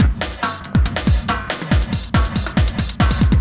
ind 140 kit

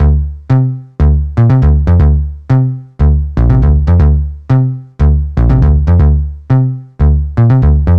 DESIGNER DANCE TOOLS

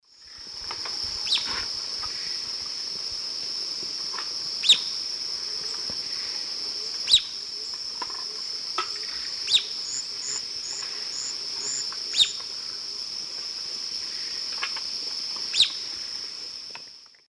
Myrmoborus myotherinus, chip calls female; S. America; Eastern Lowlands

